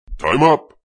time ups

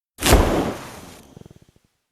Death Sizzle; Freedom Fall

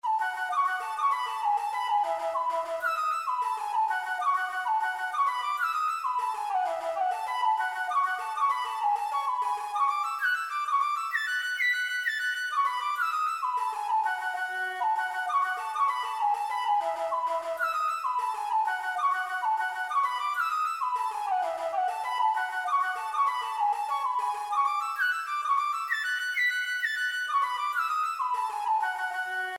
Longbow Flute; The Lab; valve

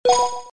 Sound Confirm

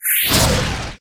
Wizard Fireball; Inotia 3

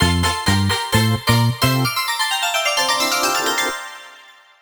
Card Win; Jing Card Win; Anpanman to Asobo New Aiueo Kyoushitsu; 3DS

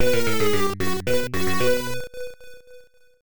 Jingle (Gag Punchline); rpg tsukuru dante98 ii pc-98 1996

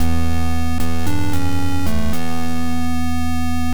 Maximum Errors

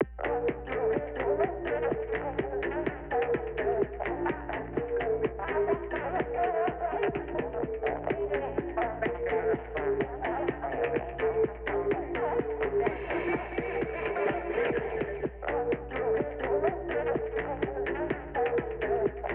Multi BGM Coop; Splatoon 3 gamerip 2022

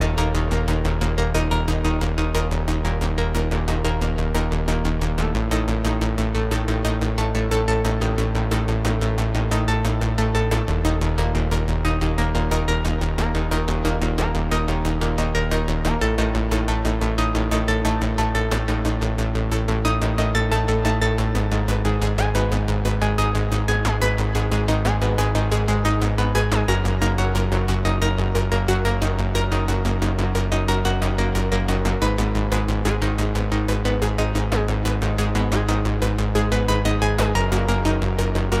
Pulse Bass Motors; Headlander

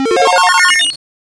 Powershot Hits Enemy

